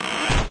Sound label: tower open; Minecraft; Votive Content; wows